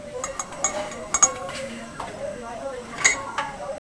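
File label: soft beep of a contactless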